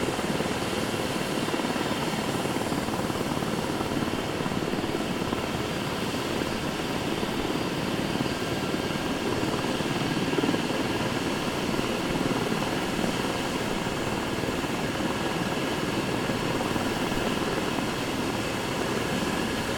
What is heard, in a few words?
heli; mcheli